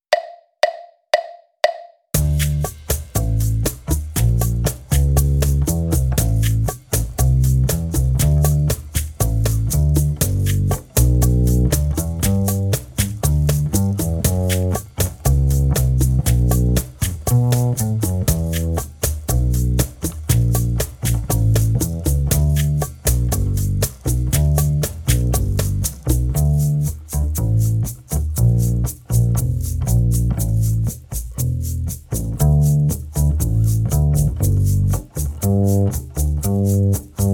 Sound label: Latin bossa drum bass 119bpm